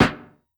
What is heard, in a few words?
Snare